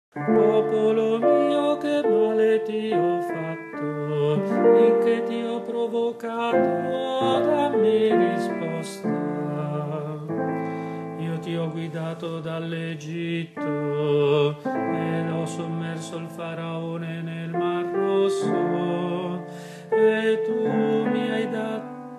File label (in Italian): canti; GIOVEDI' SANTO